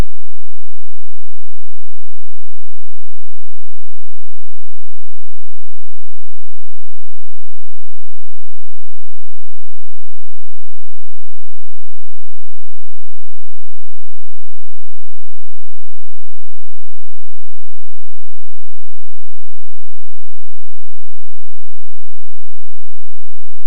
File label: bass lead